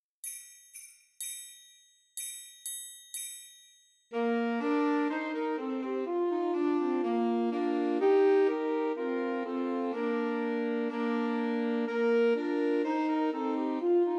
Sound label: Two Altos and Tenor Saxophone; Christmas Trios